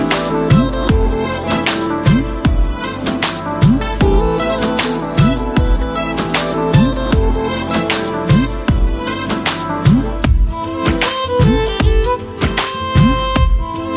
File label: hindi ringtone bollywood ringtones